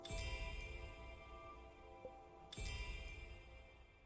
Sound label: buttons; spiritfarer